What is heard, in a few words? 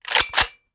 raise first charge; reloads; gmod; iw8